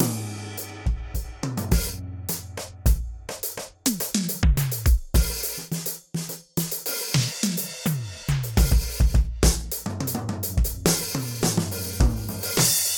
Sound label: drum solo